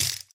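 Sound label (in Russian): отколовшийся осколок упавший на пол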